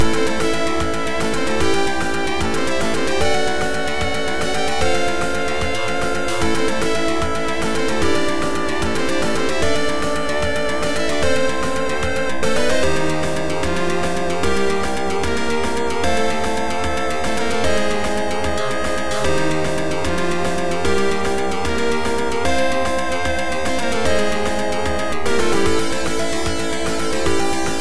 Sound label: RPG Style - Battle; Adventure Tsukuru II; PC-98